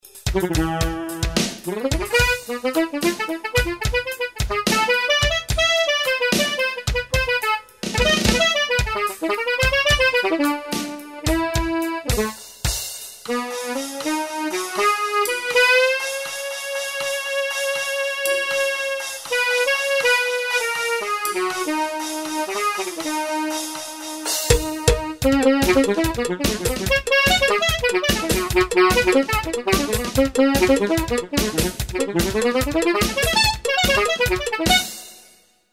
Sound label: tenor sax